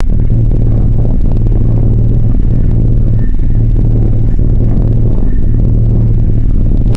buzzy sci-fi synth drone pulsing; magic-scifi

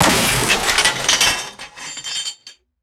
heavy flat metal crash and clatter

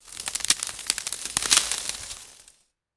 small dry branches snapping and crackling; vegetation

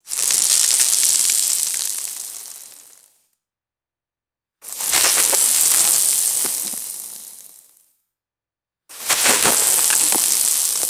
small gravel falling on dry leaves; dirt-sand

